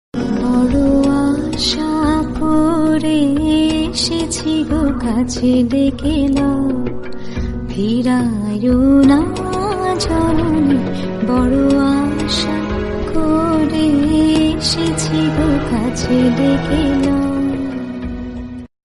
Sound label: rabindra sangeet bangla ringtone; বড আশ কর এসছ গ